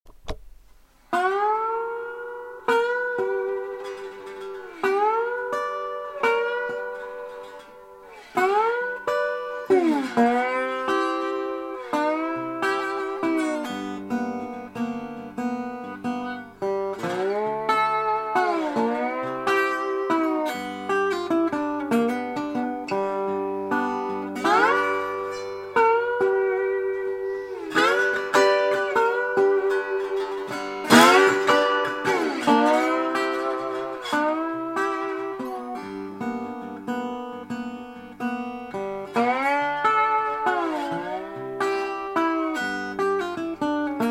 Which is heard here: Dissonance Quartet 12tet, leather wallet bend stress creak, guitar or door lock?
guitar